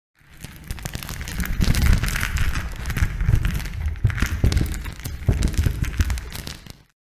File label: Falling rocks inside cave